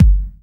BD, Dance Mega Drums, BD HOU; Best Service

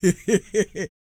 LAUGH, Jazz; Spectrasonics Vocal Planet; Jazz FX

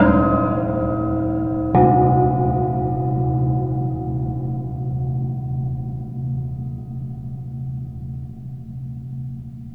Waterphone Mallet Slow; Metal; Sonic Foundry (Sony Creative Software) - Ambient Atmospheres & Rhythms; Ambient-Metals

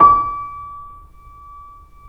C7 PP, The Ultimate Piano Collection; East West

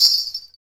METAL, Afro Shakers, Ankle Pods

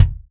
Real Drum Kit, ROCK KIT

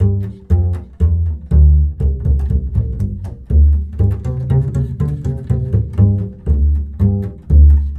Bull Fiddle, Appalachian

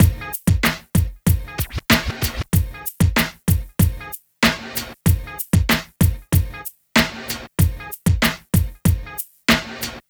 Street Tip, R&B Drums

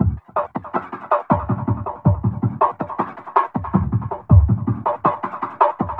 Drum Bass, Drumloops, 160bpm; Zero-G; Total Drum Bass

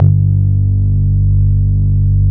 MOOG BASS; East West; Phat & Phunky

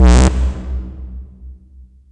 Bass B1, club techno

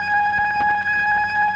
OPTIGAN G#3; Sonic Reality; Vintage Timetraveler

